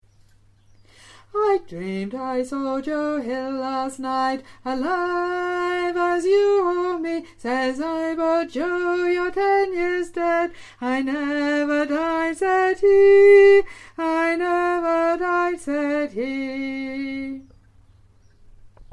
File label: Alto